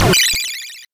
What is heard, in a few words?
Coin; Jail Break